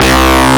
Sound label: Yamaha TX81Z Heavy Lead C2